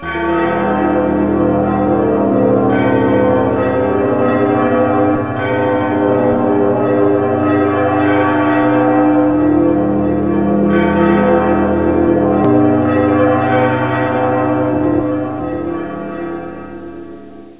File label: bells